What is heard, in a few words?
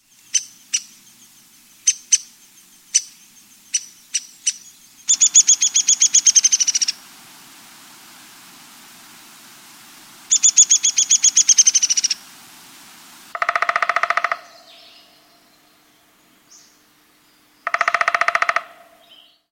downy woodpecker